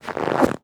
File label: Weather Snow Footstep Single